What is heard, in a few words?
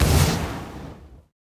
Miner Power Shoot